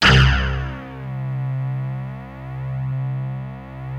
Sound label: TEK C, CHUNK FAT TE; Best Service ProSamples vol.55 - Retro Sampler; AKAI